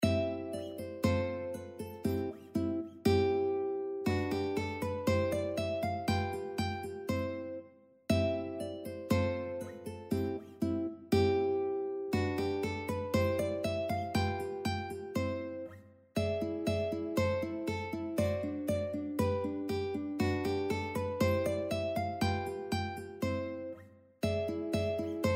duet ukulele; Écossaise by Franz Schubert; classical ukulele fingerpicking duets

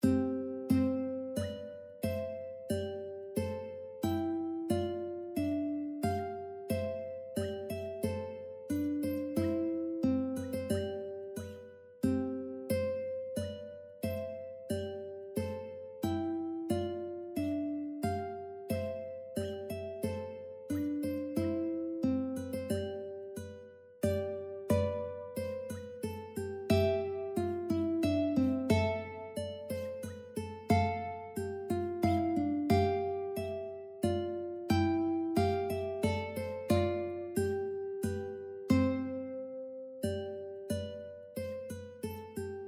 duet ukulele passepied; Passepied by George Frideric Handel; classical ukulele fingerpicking duets

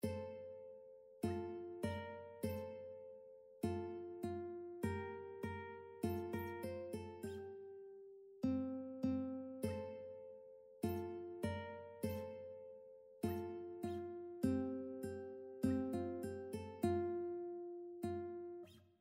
duet ukulele; Eya Popoya! by Daniel Gottlob Türk; classical ukulele fingerpicking duets

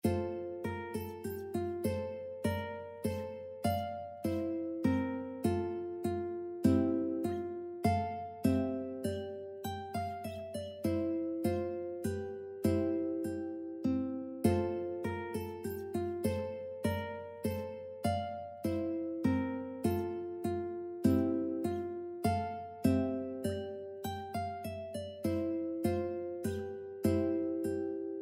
uke reveille

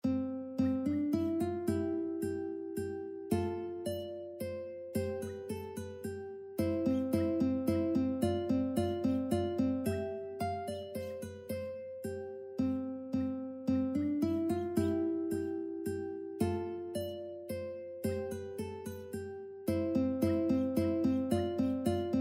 uke minuetto; reinagle

